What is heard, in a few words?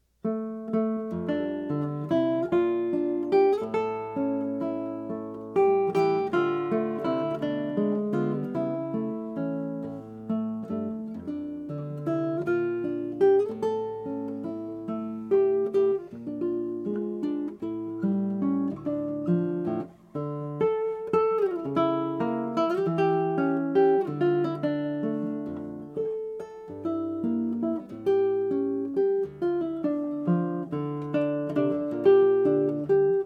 Torres Guitar